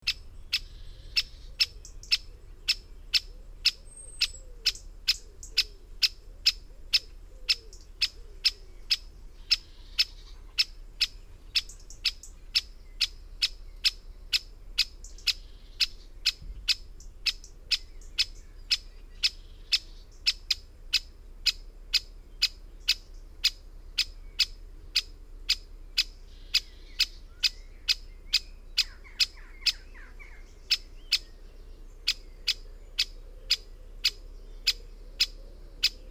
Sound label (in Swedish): Storre hackspett